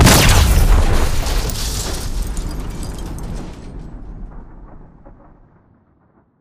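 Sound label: Reaper Nuke Destruct Explosion Close; Titanfall 2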